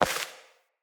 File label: Sparrow Impact; Animals; Death State